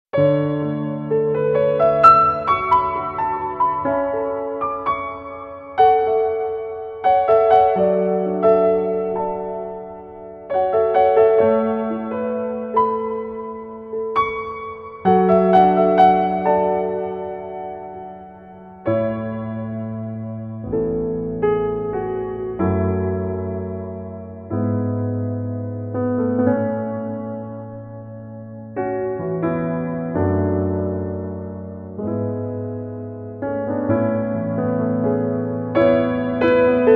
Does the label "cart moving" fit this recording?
No